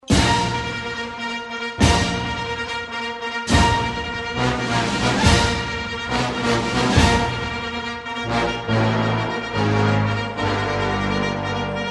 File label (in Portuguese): Danca com Clarinete; 1a Suite para Banda Op 84